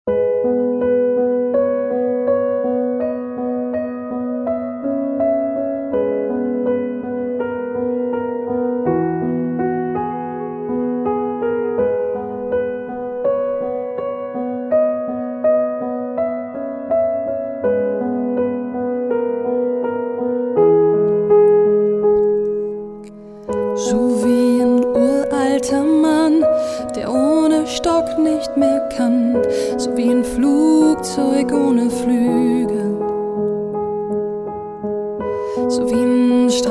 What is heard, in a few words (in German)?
Trauersaengerin; Schweiz